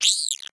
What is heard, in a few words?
egg pain